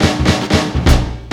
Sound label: LOOP SD, 180 BPM